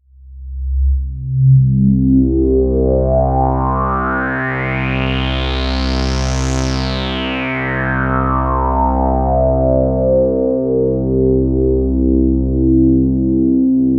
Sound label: pad c2, Synths; Polyend; Simulacrum Sounds; 1 Shots; ARP 2600; Pads